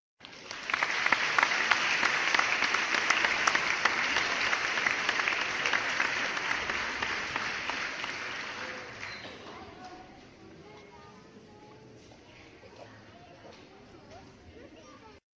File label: Applause sound effect